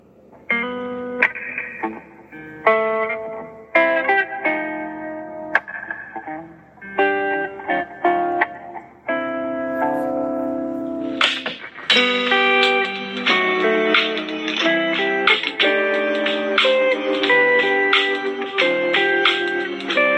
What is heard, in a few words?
Walk Home Samsung